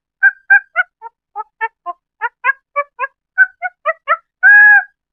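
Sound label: monkey idle normal; ambience avant gardens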